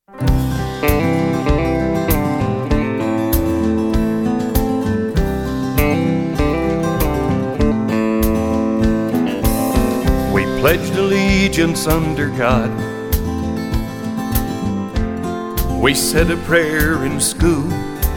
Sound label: Once Upon A Time America (acoustic)